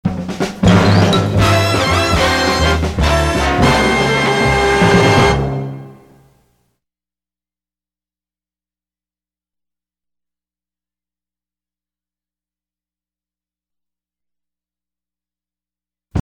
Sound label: Orchestra W X Y Z; Pams